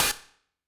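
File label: open hi-hat Harsh Drag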